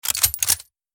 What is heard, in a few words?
reload sniper